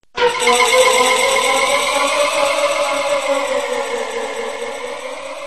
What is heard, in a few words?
ghost